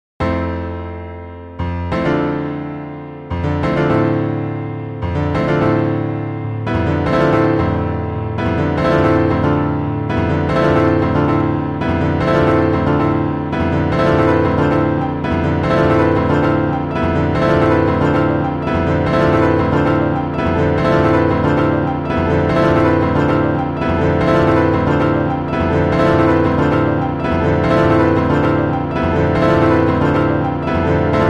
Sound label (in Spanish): PIANO